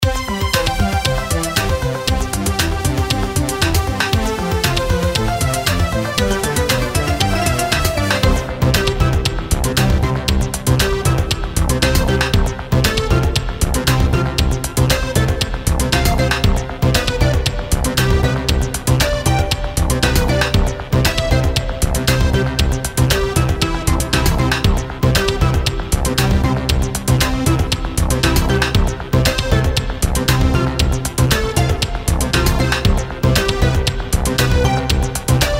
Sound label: sports highlight music